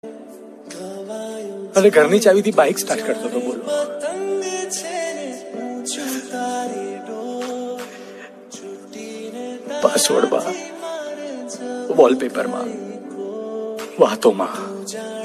sad gujarati ringtone

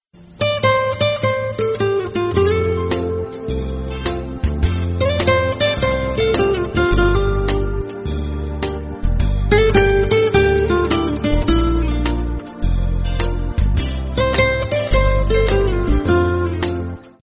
indian instrumental mobile ringtones

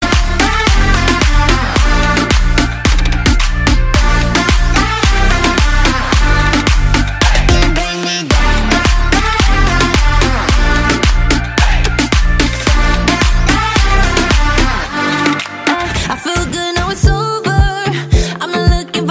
electro ringtone

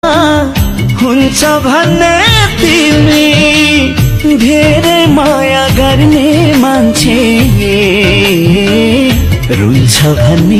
nepali ringtone

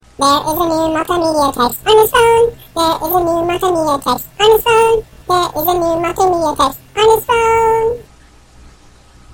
multimedia txt ringtone

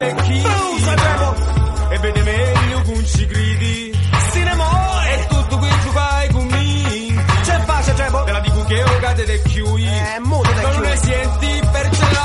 Italian reggae tune ringtone

